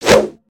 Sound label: Staff Spinjitzu; weapons; aronwk collection; ndaudio